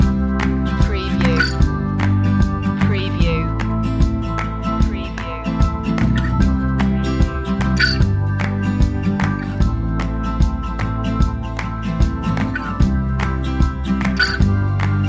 the whistle song loop

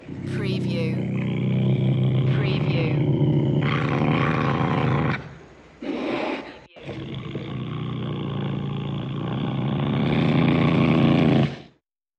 Leopard Ocelot Growls

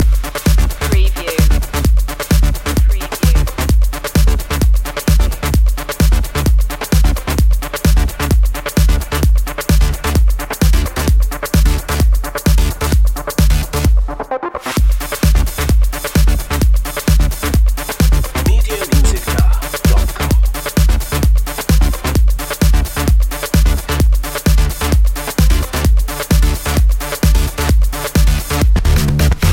acid funk sandwich loop

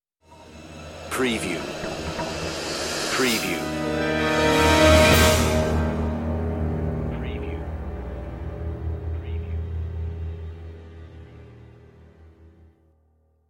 digital voodoo stinger

